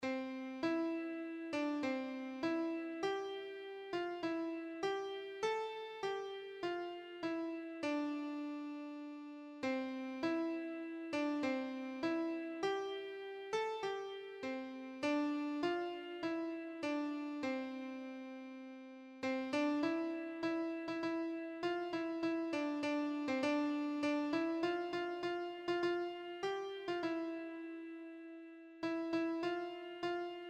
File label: at the cross, keyboard